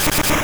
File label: Low Kick; Pokemon Essentials; PKMN Estelar 1.3